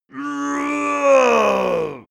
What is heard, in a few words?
male die growl; soundpool